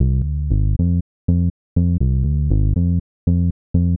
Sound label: Smooth Bass (120BPM), retro house